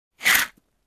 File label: Tearing twig apart